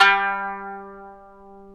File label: ETH KANUN, Eth Plucks, Saz & Kanun